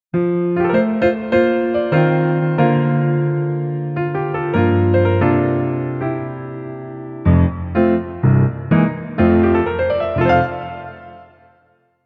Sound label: Infernal Fruit Jingle; bayonetta origins cereza and the lost demon; Digging In